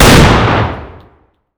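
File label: fire distant 50bmg rifle interior; gmod; arccw uc commons attachments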